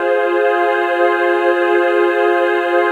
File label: PAD, Dance Mega Synth